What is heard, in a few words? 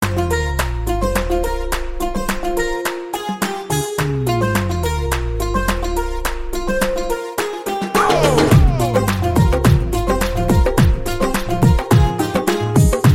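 background music Ringtone; Badrinath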